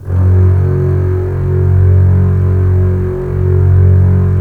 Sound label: STR CBS, Roland LA Composer